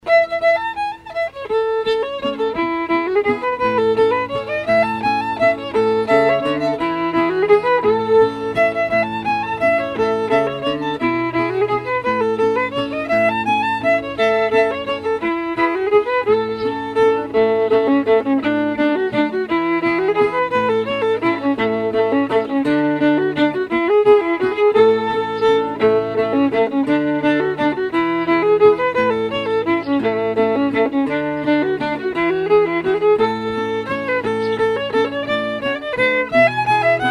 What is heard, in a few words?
Waltz